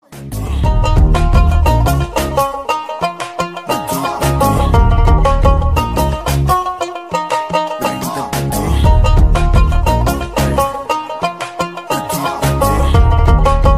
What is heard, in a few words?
latest instrumental ringtone